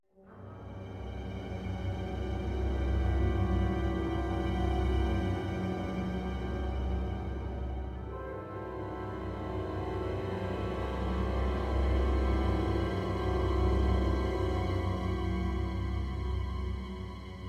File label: Riser; N.O.V.A. 3 Near Orbit Vanguard Alliance